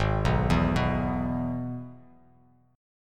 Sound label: Gsharp Minor Sharp 5th, Bass Standard; arpeggiated; 4,2,2,4